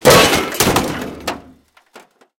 streetlight crush; tanks; War Thunder

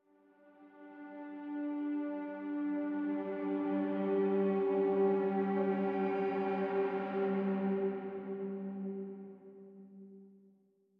music interactive; World of Tanks

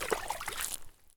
swamp turn; Escape from Tarkov

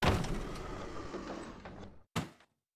Aircrafts F-5E Canopy Open; DCS

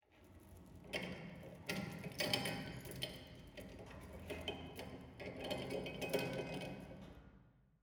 hangar layer; War Thunder

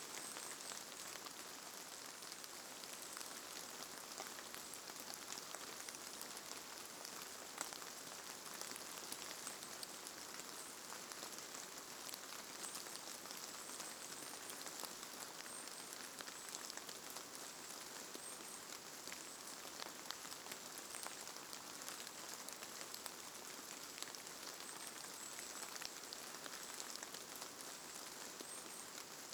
ambience rain light; Rust

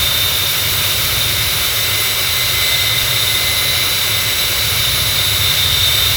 Aircrafts Engines Engine TV3117; DCS

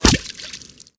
water small

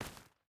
footstep barefoot stones run; Rust

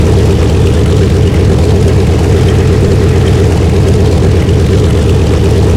an2 rear, engines; War Thunder

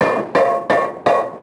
hammer; World of Tanks Blitz; hangar blitz

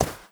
horse footstep sand hard; Rust

